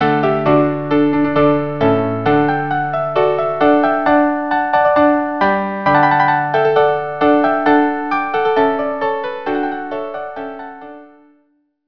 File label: rondeau string quartet; mouret